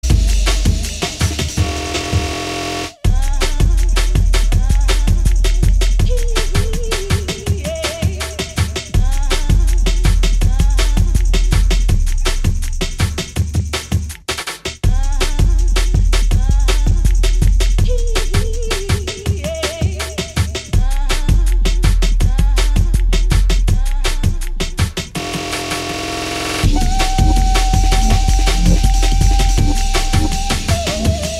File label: 24-7 drum and bass